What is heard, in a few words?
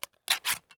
food can open; Escape from Tarkov